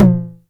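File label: 909 high tom tape; Toms; Goldbaby Tape808 & Tape909; The Tape 909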